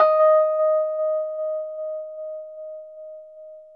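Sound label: Rhodes, D#4, left; 3D Sound; 3D Keys VOL-1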